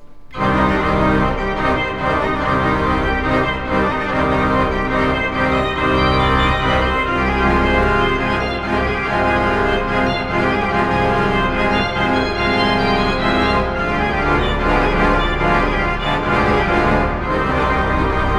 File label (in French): Orage et tonnerre